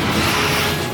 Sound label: Phaser; G Class